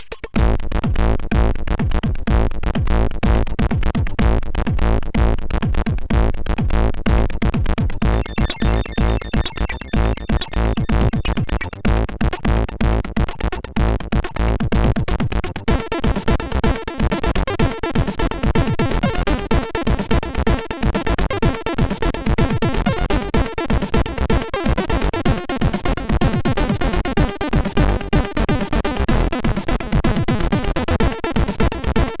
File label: Intro Tune, sid MOS6581R3